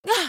bump; Becca

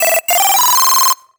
Solo Chip Square Positive; MUSIC EFFECT; startide

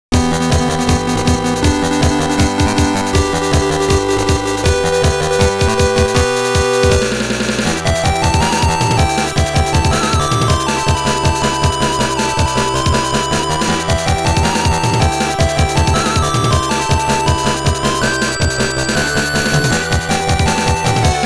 Boss Battle; rockman sound box 2

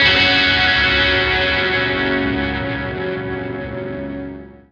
Lake Dirty F sharp

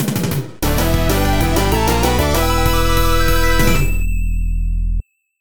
Act Clear; sonic forever the epilogue expansion; Tails - Sonic 2 SMS Version

